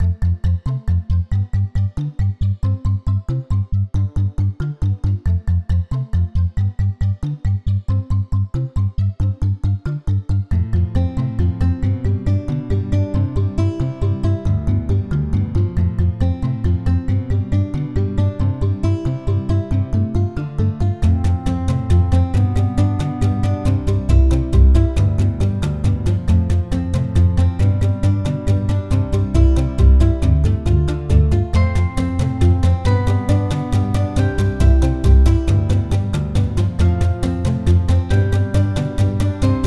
quirky impression

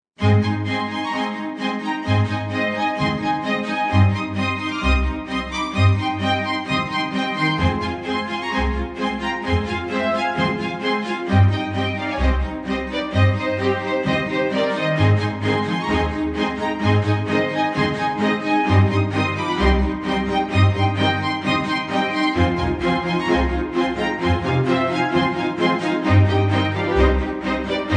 uplifting grounds

